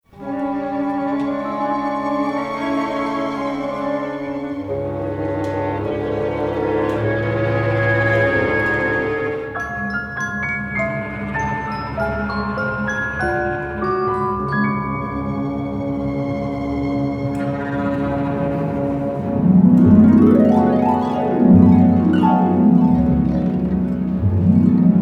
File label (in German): klavierkonzert einleitung